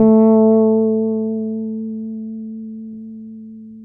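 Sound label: A 4, fretless, bass; Spectrasonics; Bass Legends CD 1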